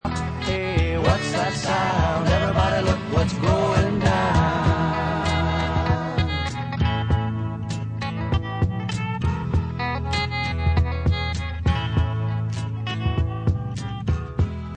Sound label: guitar